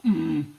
"Mmmh"

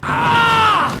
pain

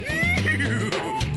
laugh

